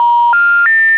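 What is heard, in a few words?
Disconnect; Microsoft Lumia 550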